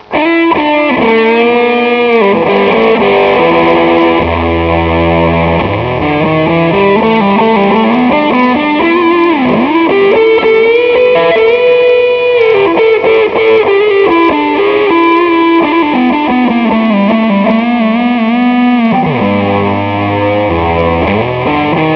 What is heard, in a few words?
guitar